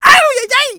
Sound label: hit voice ouille; perte